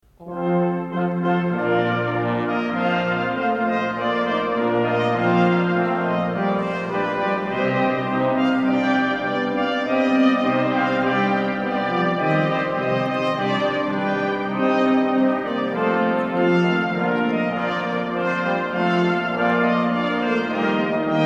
Symphony, orchestra choir brass percussion; Monteverdi; schnifis

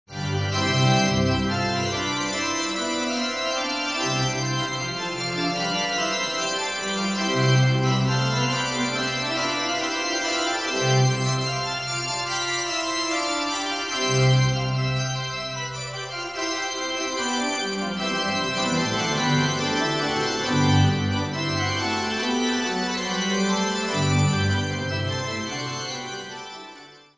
toccata, organ; j.s.bach; bwv564

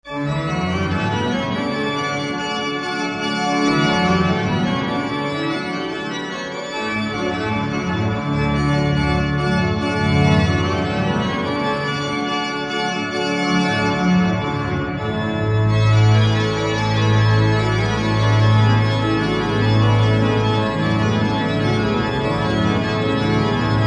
organ; bach; bwv532

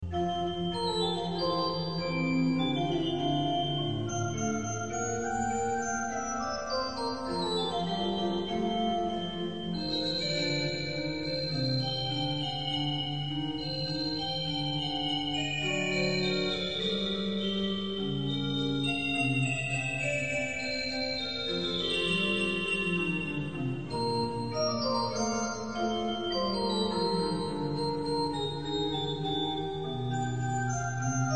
Andante, organ; W.A.Mozart

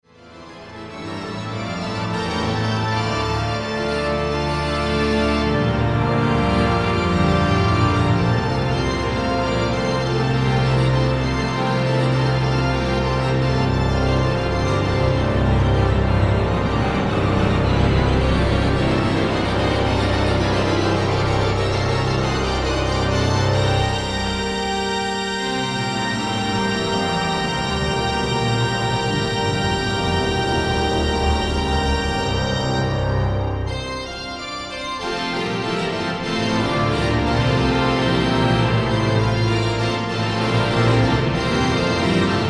Sonata, organ; Mendelssohn

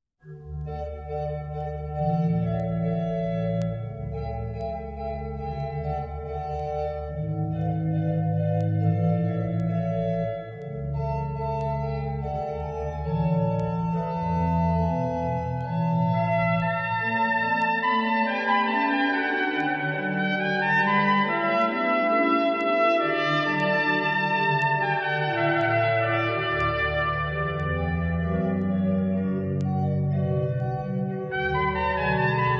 Sonata D, organ trumpet; Torelli

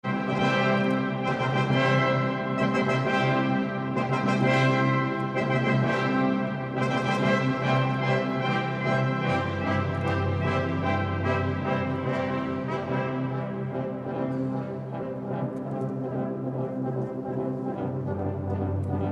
Fanfare, brass percussion; Lully; schnifis

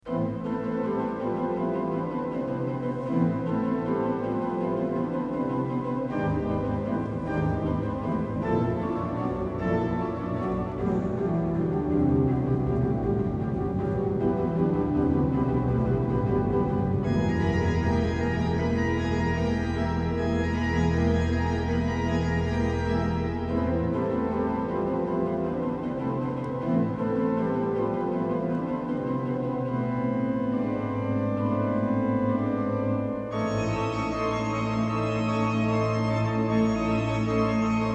Spanish Dance, organ; MiguelPerez; bruselas

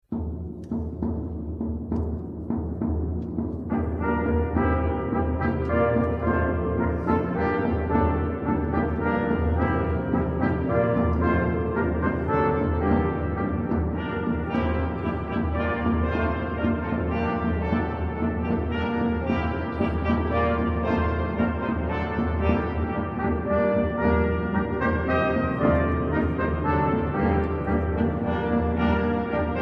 Final Dance, brass percussion; Phalese; schnifis

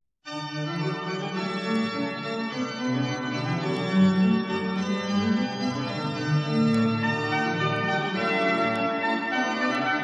Sonata D, organ trumpet; Torelli

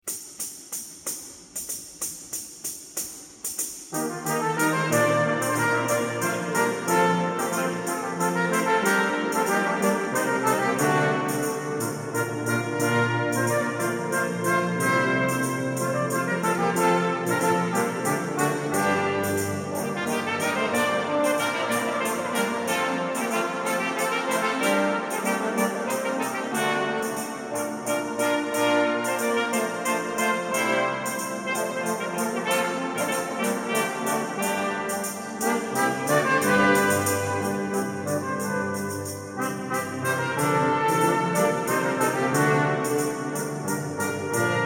Gaillarda, orchestra choir brass percussion; Phalese; schnifis

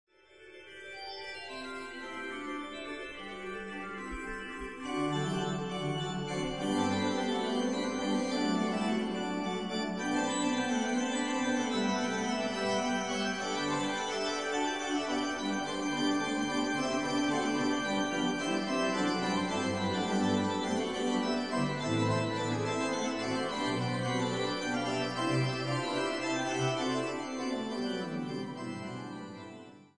fugue, organ; j.s.bach; bwv564

